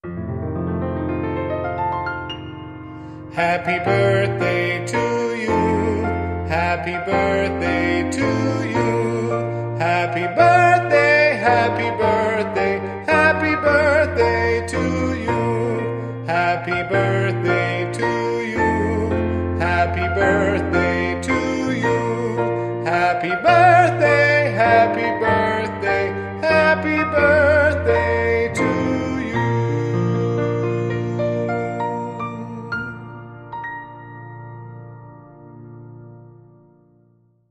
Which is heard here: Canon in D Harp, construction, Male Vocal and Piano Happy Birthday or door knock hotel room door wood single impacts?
Male Vocal and Piano Happy Birthday